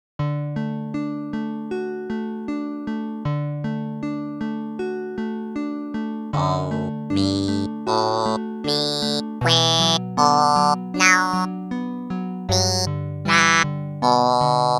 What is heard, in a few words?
Lullaby; animal crossing new horizons original soundtrack 2 2022